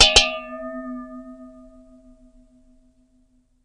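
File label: Champagne Toast Double, glass clinks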